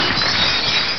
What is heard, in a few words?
glass breaking